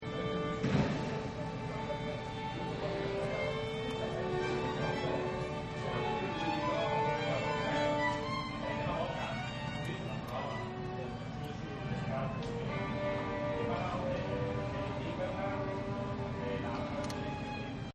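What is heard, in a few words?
Orchestra Tune Up; Concerts; Backgrounds; Live Music Events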